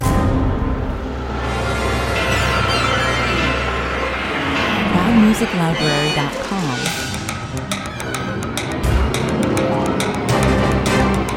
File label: bat race (cartoon) 105 bpm